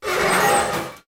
wheel, buttons; svencoop

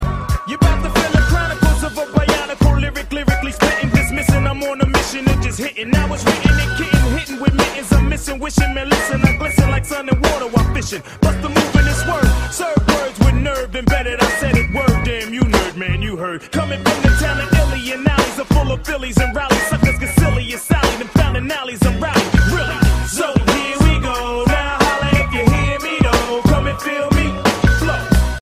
jam

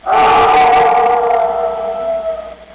howl

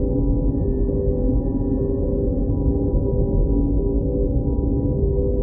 lab drone, ambience; gearbox

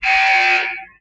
big warning, ambience; gearbox

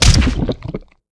egg die

